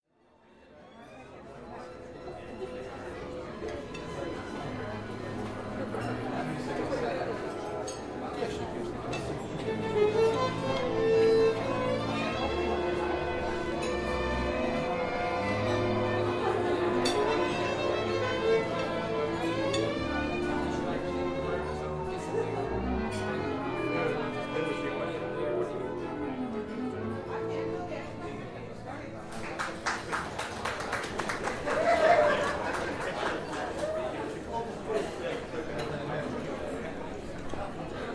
Bringing The Goulasch To The Table In The Mátyás Cellar